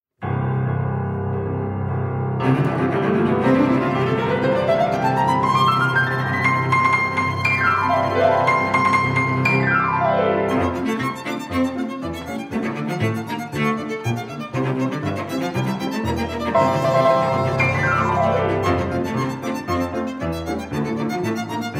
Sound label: Finale, PIANO TRIO SUITE FOR VARIETY ORCHESTRA; SHOSTAKOVICH